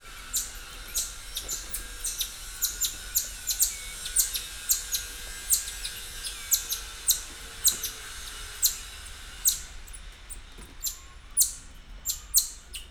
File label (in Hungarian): majmok, ezustosselyemmajom